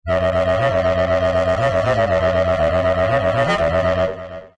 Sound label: Synth Mono